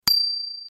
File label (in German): Fahrradklingel; Clever VIII